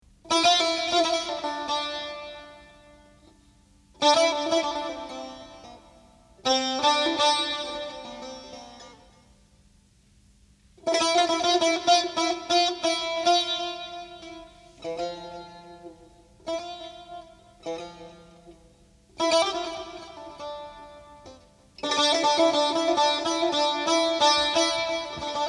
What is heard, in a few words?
Taknavaziye Saz